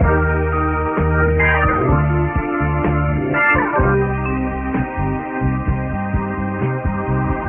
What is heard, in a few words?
keys, phonky loops